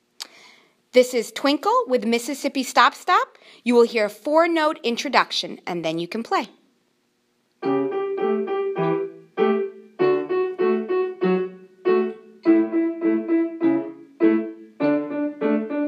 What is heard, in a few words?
Twinkle w MSS Practice Tempo